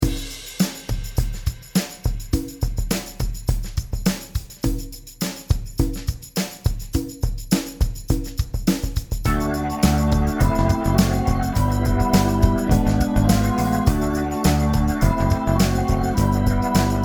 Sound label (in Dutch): Solo